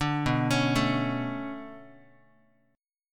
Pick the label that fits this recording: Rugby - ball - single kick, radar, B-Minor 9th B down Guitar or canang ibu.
B-Minor 9th B down Guitar